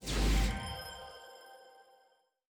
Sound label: dark mage heal impact